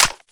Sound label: aug clip hit, weapons; Counter-Strike Global Offensive